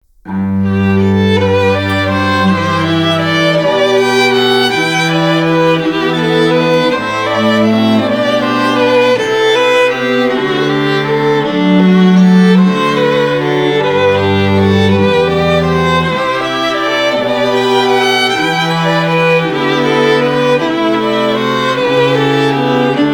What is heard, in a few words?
Classical Quartet